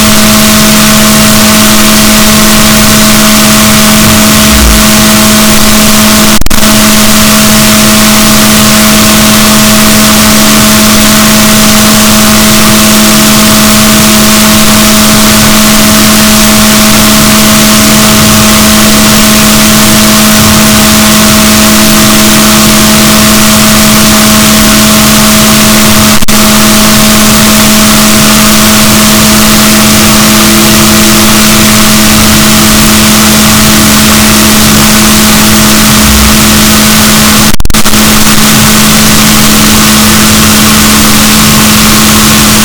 rorquals negatif, boat

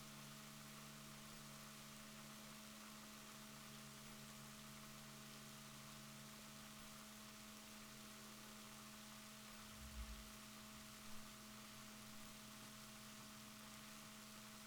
rorquals positif, Balaenoptera Physalus Chile

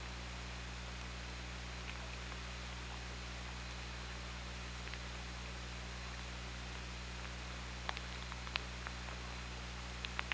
OrcaLab

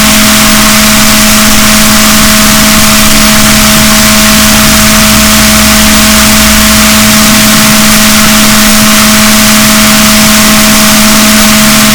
rorquals negatif, boat

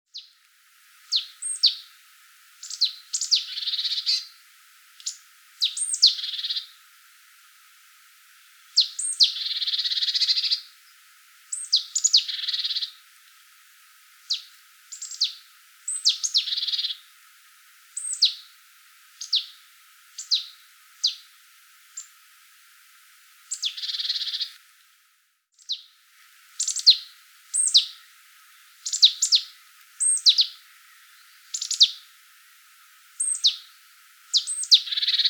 Poecile palustris; SETE

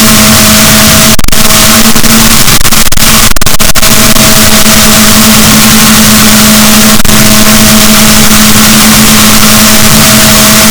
rorquals, Balaenoptera Physalus, boat

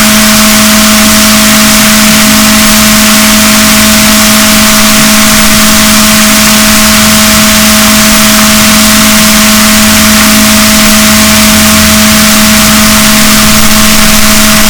rorquals negatif, boat

